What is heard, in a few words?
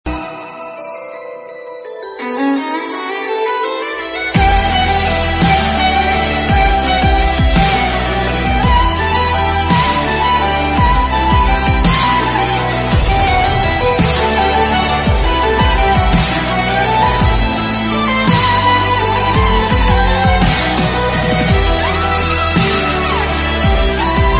Carol Of The Bells Ringtone